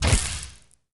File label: overheat, plasma; plasma weap